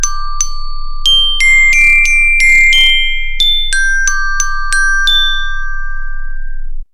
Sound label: tone button bass